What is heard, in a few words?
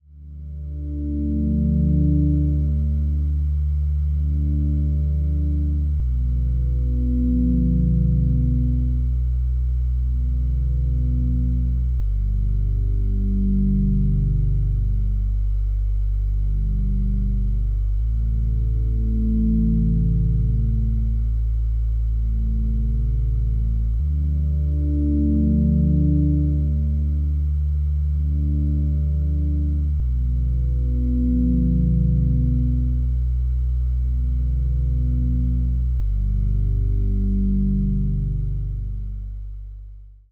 background music